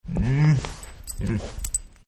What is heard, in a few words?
Dog Frustrated; Dogs; Partners In Rhyme